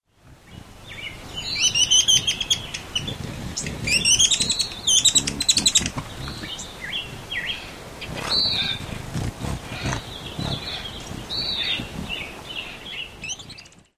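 Magnificent Hummingbird, laughing calls and other notes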